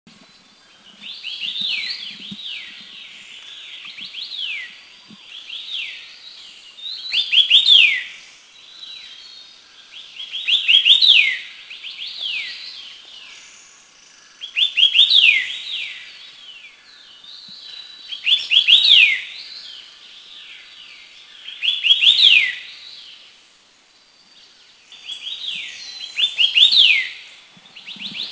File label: White-eared Sibia; Heterophasia auricularis Country endemic; Anmashan; Taiwan